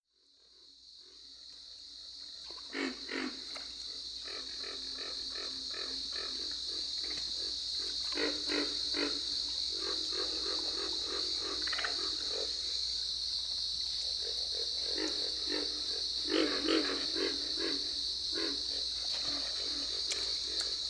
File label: Pig Frog, Gopher Frog